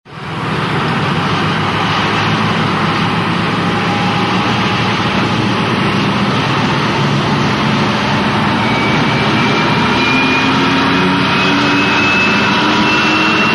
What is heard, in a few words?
train leaving